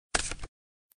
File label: move